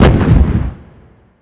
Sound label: small explosion; Earth and Beyond; EA Games